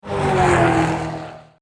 Rev; Nitroade; cars

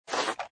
toybox open; toystory; toystorymania

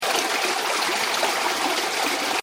rushing water; kickbuttowski; mellowbrook mayhem

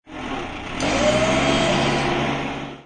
Dump; disney; big crane game

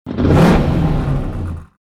Rev; Leakless; cars

